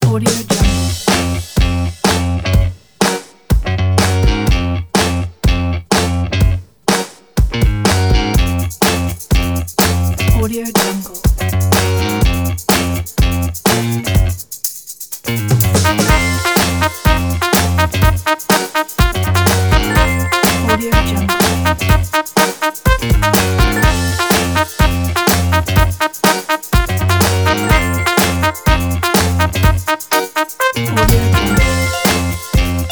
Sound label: funky commercial